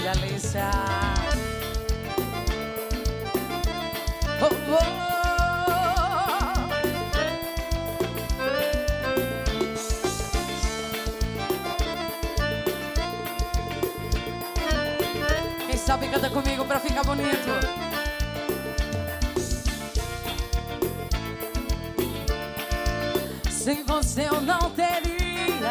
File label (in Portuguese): cantora